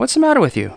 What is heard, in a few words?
bumping; Messiah